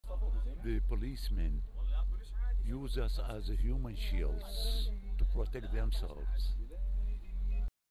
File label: loop